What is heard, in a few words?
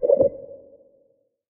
Watcher Idle; Watcher (Legacy)